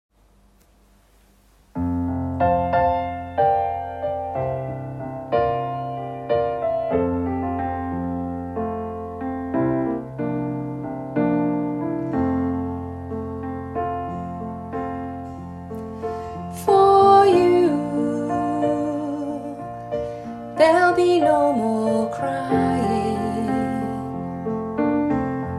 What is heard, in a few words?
alto